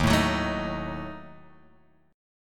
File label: D sharp Diminished D sharp Guitar Open G